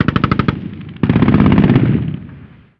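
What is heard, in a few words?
gun us assault medium; ModernWarfare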